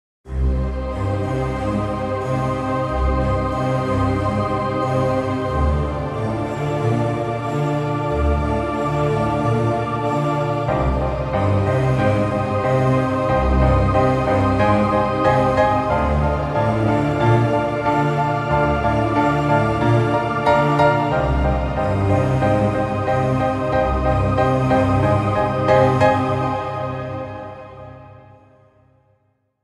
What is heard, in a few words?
Short Dramatic Intro Music